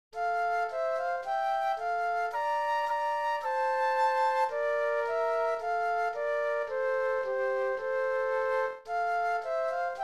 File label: Flute Duet